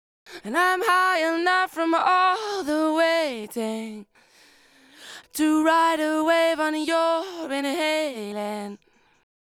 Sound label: lead vocal chorus; Multitrack